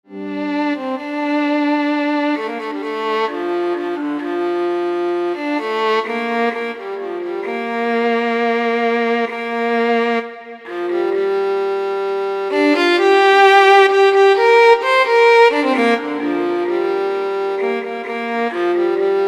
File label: violin only